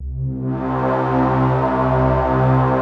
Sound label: APPROACH, PADS; Masterbits; Vintage Voltage